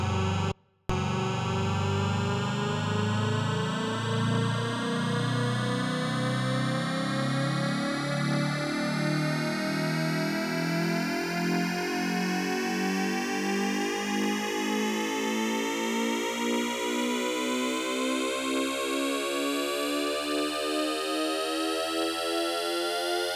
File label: Nasty Drone Lifter